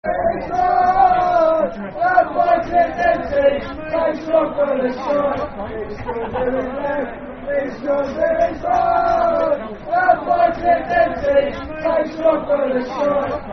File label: football chant, soccer songs; That Boy Clint Dempsey; Fulham Ffc